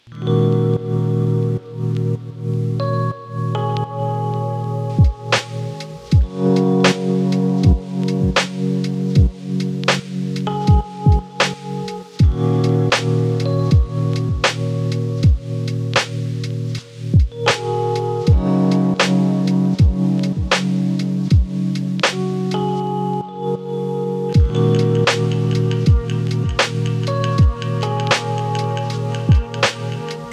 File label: lofi hip hop